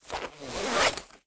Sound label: Zipper; blue archive